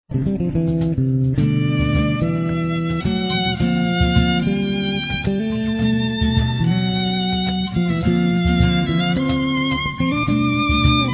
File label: improgressive